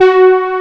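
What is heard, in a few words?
brass G3, SYNTHESIZER analog brass; AKAI S6000 CD-ROM - Volume 1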